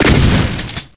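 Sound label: shotgun; DOOM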